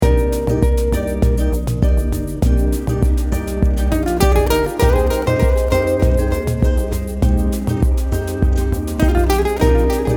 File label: Lounge